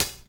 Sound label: HH, Closed Hats